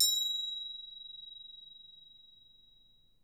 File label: Percussion A#4, Harp; Miroslav Vitous - Percussion & Harp